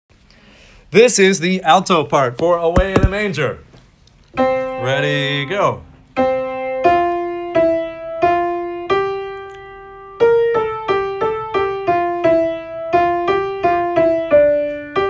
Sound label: alto; away in a manager